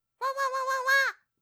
システムエラー

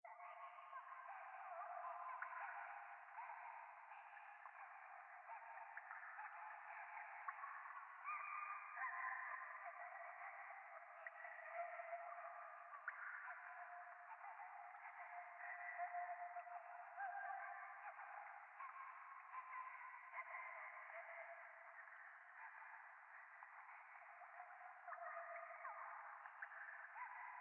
可愛い喘ぎ 雨上がり, ASMR

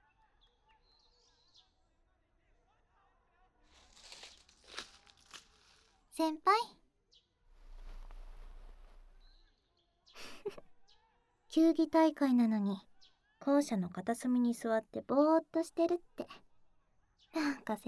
ローション耳舐め手コキ; SE大きめ

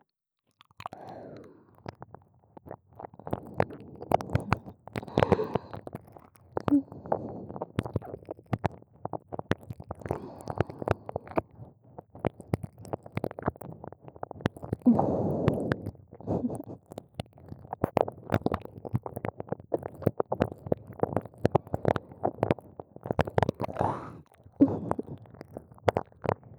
耳奥舐め (左耳)

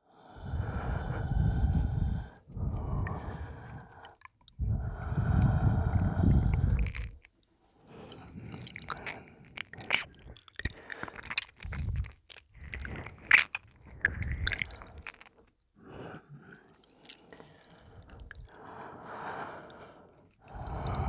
シンプルまったり耳舐め, ASMR

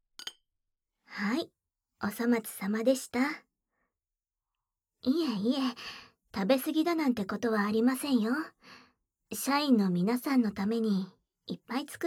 耳舐め; お手々エッチ

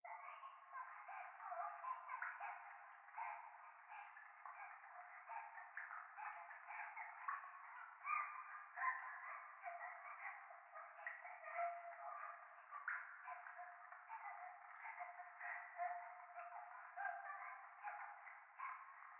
教会 可愛い喘ぎ 雨上がり

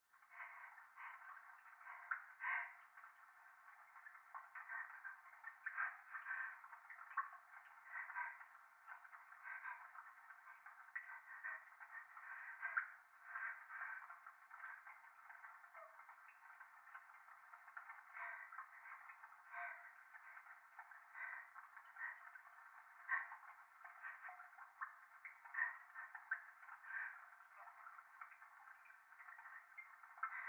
トイレ 左耳快感吐息 雨上がり

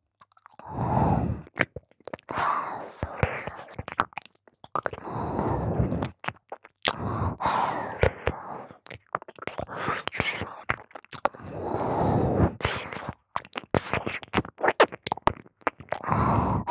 耳舐め, 妹; ポーラ

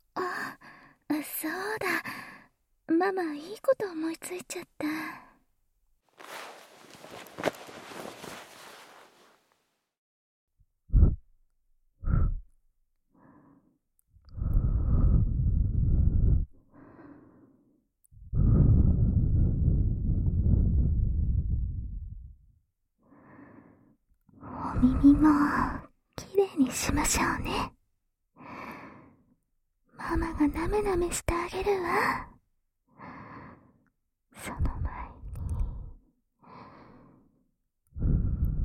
あまあま耳舐め

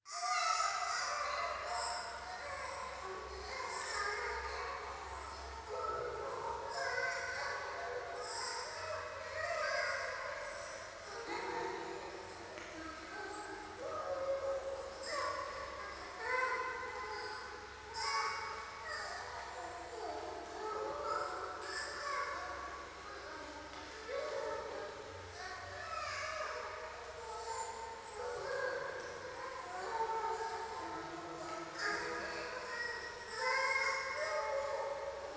教会 シャブ喘ぎ 雨

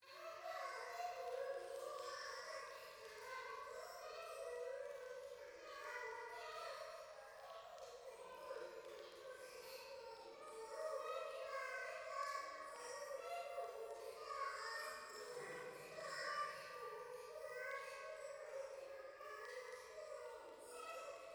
可愛い 喘ぎ 暖炉; 夢の中

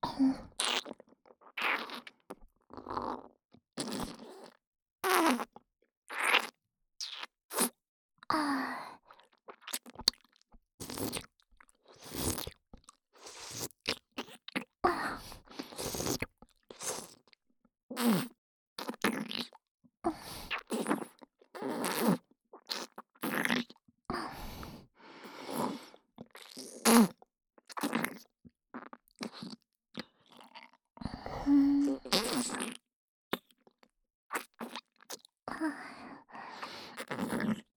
ツンデレ ねっとり左耳フェラ; フェラ素材

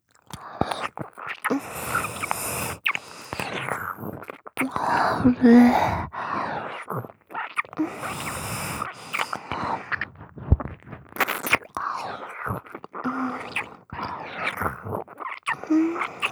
耳舐め（激しい）

